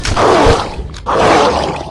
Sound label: hungry shark world hurt sound